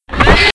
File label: Door Open